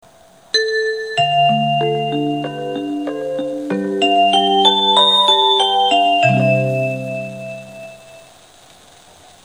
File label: west chime